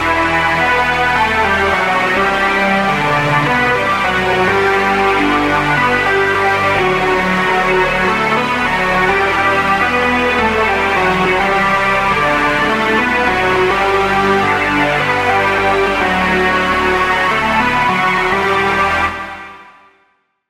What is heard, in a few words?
Lead; Mythical Beast; Routed Sound; Serum 2 Expansion - Flame Summoner Vol.1